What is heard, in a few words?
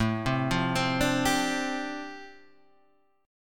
G 7th Suspended 2nd Suspended 4th A